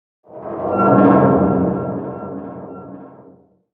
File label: big chains groan